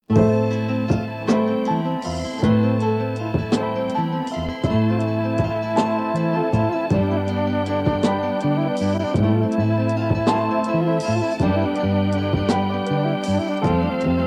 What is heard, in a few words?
instrumental, persian